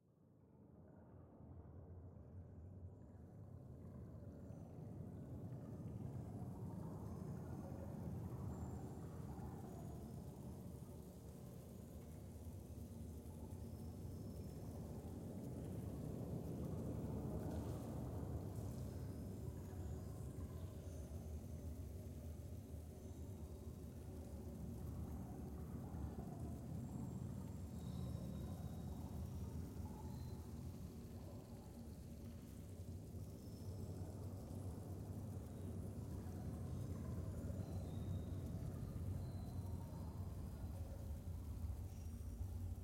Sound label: AMBIENCE LEVEL ATMOS LAYER; Semblance